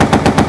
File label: cannon; Team Alligator